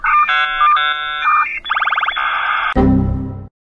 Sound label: errors